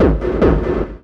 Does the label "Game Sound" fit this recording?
No